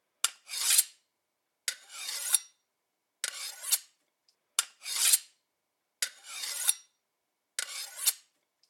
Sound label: Large Blade Weapon Fix; Scrap Weapons; Zomboid